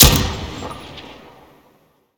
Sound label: m26 shoot; Tacz1.1.5+; RSWS 1903